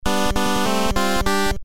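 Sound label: gameboy 8 bit game sound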